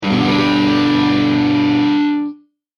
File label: Rock Guitar C Fretboard Voicing; chorducopia